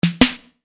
Chime Percussion; Web Interactive; Technology; Multimedia; Musical

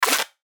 FLASH GEAR; High Tech; Multimedia; Blastwave

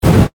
Flame Booster Short, Burst; Spacecraft; BlastWave

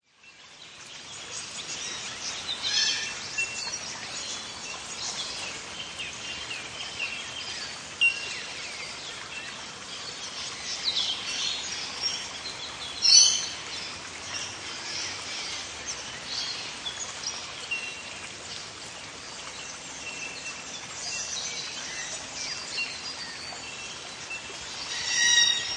Healesville Sanctuary, Rain forest after rain; Healesville Sanctuary Rainforest: Rain forest after rain has just finished. Birds squaking in the trees, water dripping off leaves

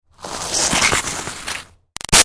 Snow Slide By Icy Scrape Bumpy; Skiing and Snowboarding; Sound Effects:Sports; Big Room Sound

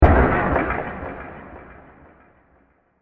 Wood Crash With Delays And Reverb; Bursts; Impacts; Crafted Signals

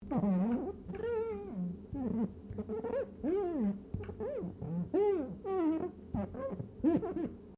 GLASS SQUEAK FINGER SEQUENCE; Material components for constructing more complex sounds

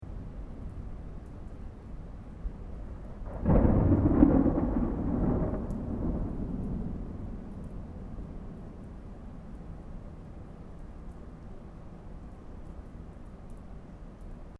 THUNDER DISTANT STEREO

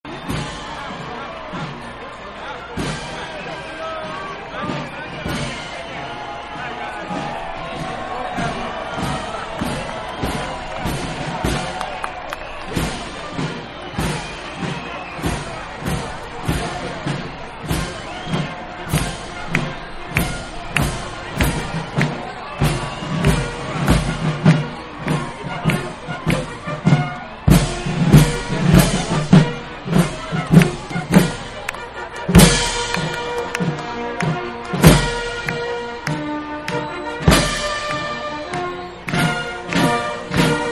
Band Pass By Crowd Cheers, Parade; Parades; Backgrounds; Manhattan Production Music

